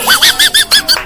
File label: freaky ahh laugh